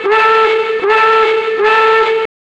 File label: reverse, Boat; DaVinci Boat